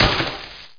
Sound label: bsh tree; Prangs